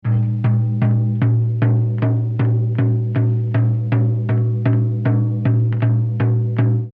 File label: Dum Dum drum pattern; chants Dane-zaa; Premières Nations de Doig River